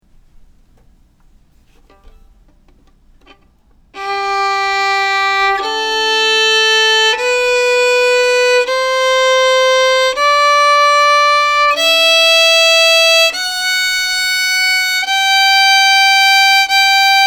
Scale G high octave

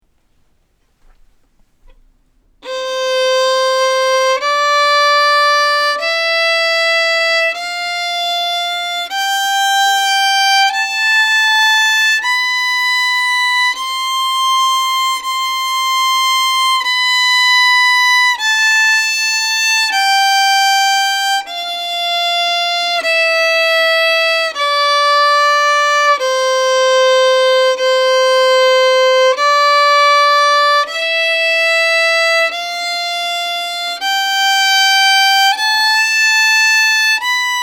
Scale C high octave

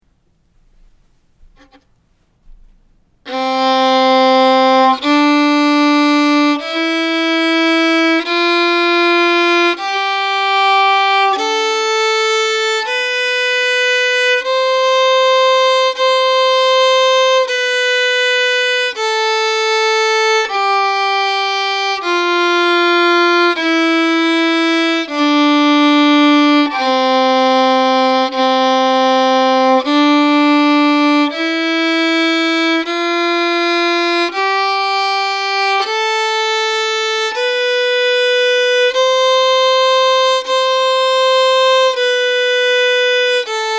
Scale C low octave

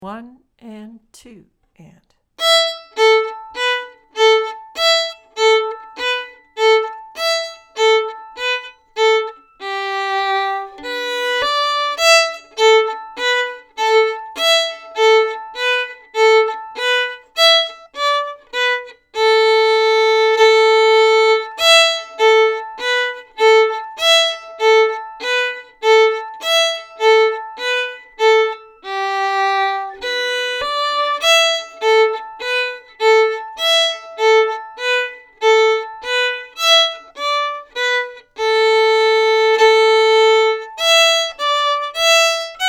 Daleigh Polka Practice

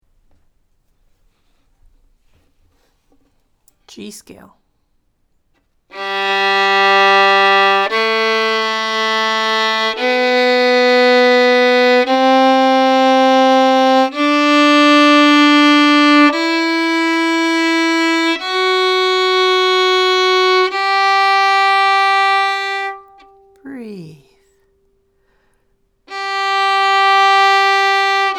Scales G Major

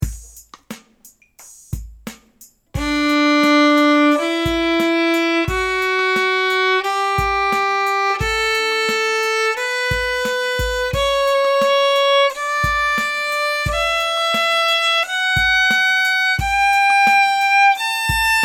D scale 2 octave

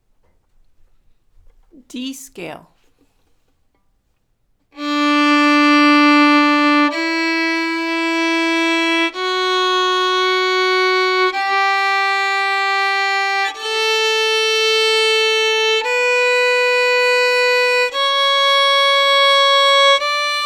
Scales D Major single and 4 mini bows

